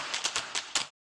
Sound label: shuffle step rock step taps